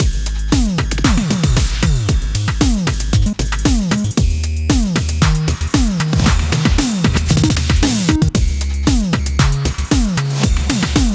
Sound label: drumfills